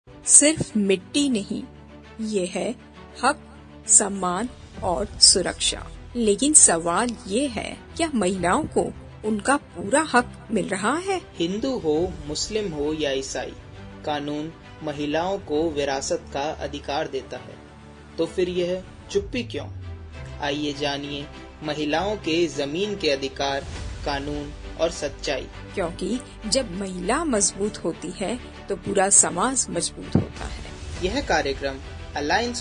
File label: jingle